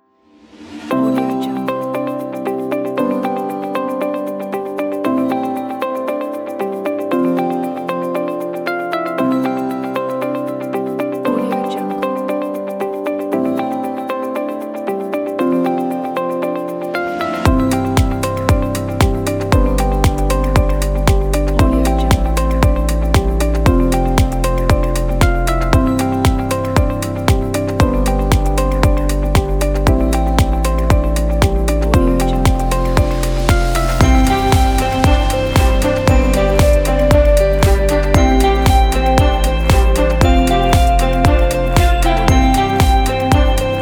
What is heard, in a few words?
dancy slideshow corporate